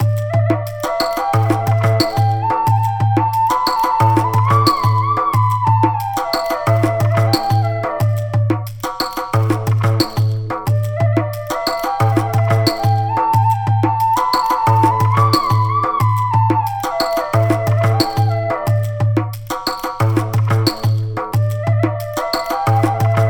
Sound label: Flute Pentatonik